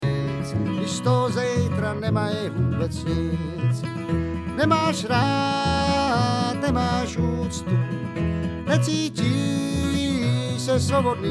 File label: vocLead, bluegrass